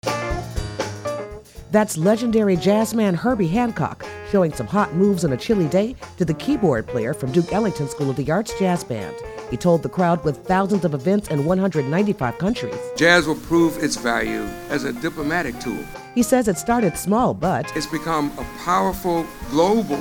JAZZ DAY

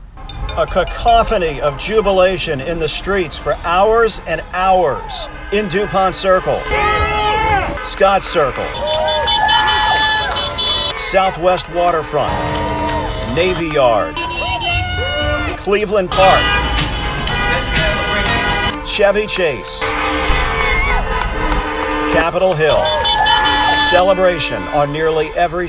spontaneous celebration

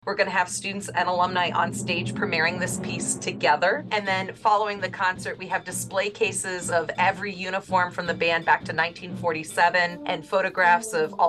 HIGH BAND; HERNDON

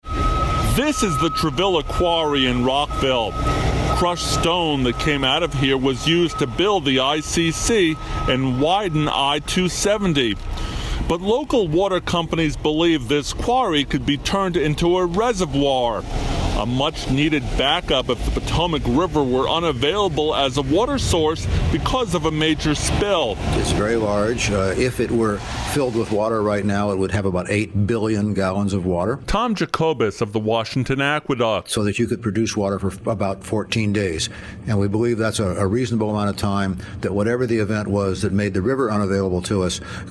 CRUMBLING Water

